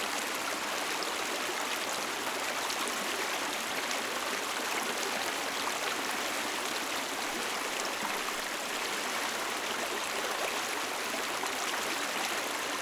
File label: Ambiance River Moderate Loop Stereo; Nature; Essentials Series NOX SOUND